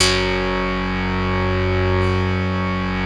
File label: basses; Wizoo - Powered Wave